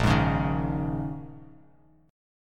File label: Bb Minor 11th, Bass Standard, Bbm11 chord; strummed; JGuitar